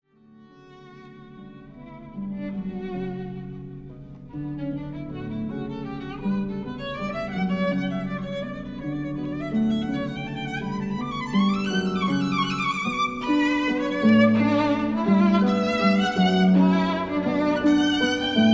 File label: fantaisie in a major for violin and harp